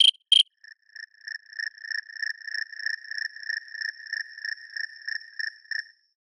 Night song, Natural Elements, ringtones; Google; Google Sounds

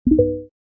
usb; Oppo Find X5

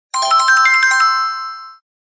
Simple Bell; Samsung SC-01B

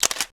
Shutter; KV920

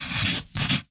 Pencil Writing; Realme 8

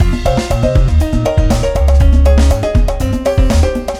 Latin, ringtones; Google; NewWave Labs

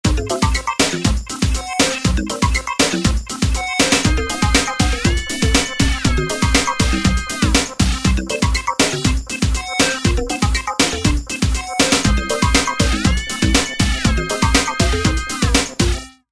Lagtime; Sony Ericsson; PlusFourSix; Jie (K630i)

